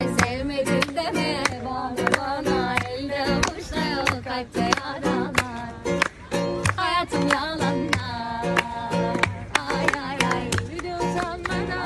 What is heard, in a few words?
Guitar